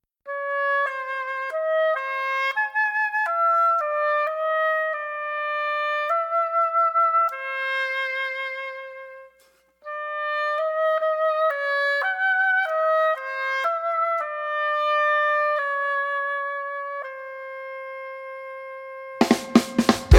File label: punk jazz, instrumental